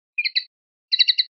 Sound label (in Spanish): toques de tateo; pardillo; rep adornos